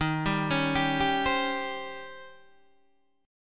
D sharp 13th, Guitar, DADGAD

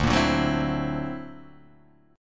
D Augmented 9th down, guitar

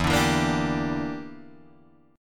E Minor 7th Flat 5th E, Guitar Drop D

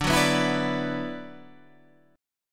F sharp 7th Suspended 4th Sharp 5th D

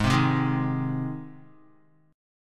Ab Major Ab down Guitar; strummed; JGuitar

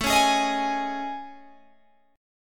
A Major 7th Flat 5th, Guitar Open G; chord

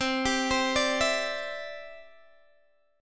C Major Add 9th, Guitar DADGAD

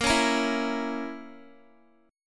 A sharp Diminished Guitar, chord; A#dim; strummed; x,13,13,15,16,x

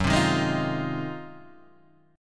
G sharp 13th F sharp

